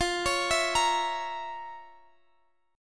F Major 7th Suspended 4th Sharp 5th, Guitar, Drop D; x,x,15,18,17,18; down; chord shape